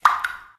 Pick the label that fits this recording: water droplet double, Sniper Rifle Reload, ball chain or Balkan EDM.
water droplet double